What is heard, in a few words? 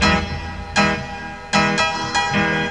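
PIANO loop; USB Soundscan vol.27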